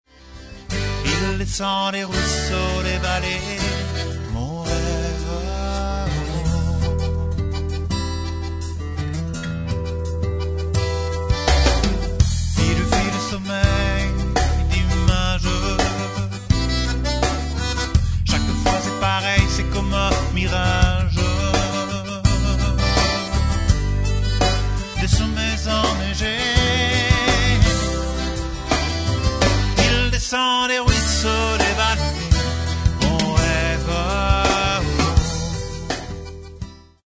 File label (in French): accords